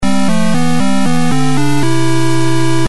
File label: Wave Completed; starpot